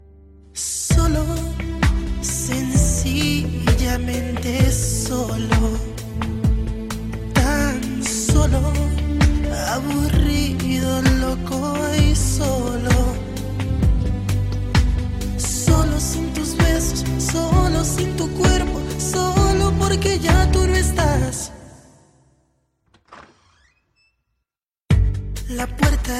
Solo

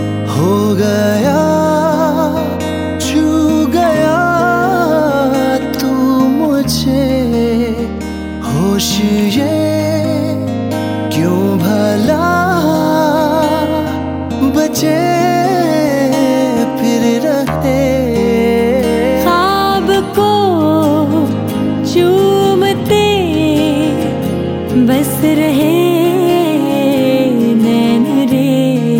Romantic Music

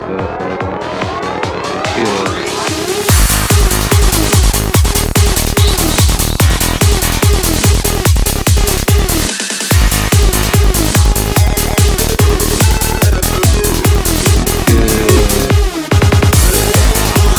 Da Best Techno

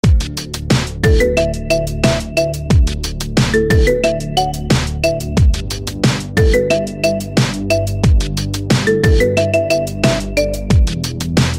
Marimba

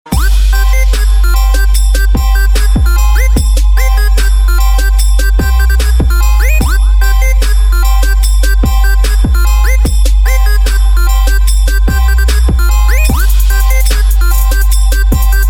Trap

